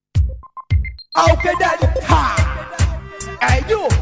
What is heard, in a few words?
piccolo